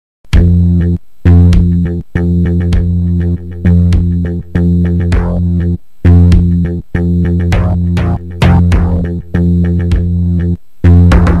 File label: music stealth pilot laidback danger; roblox